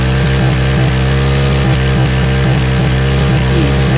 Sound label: motor